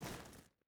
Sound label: footstep hide stones walk; Rust